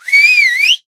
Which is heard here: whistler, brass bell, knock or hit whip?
whistler